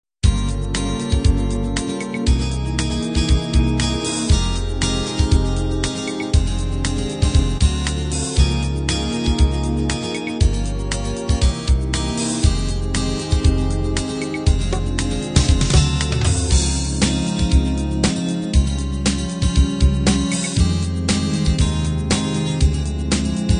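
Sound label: standard 16 beat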